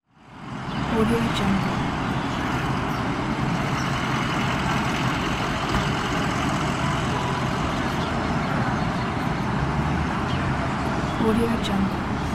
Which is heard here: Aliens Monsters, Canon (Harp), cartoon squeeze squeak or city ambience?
city ambience